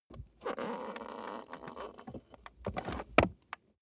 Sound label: plastic plate crevice rub squeak long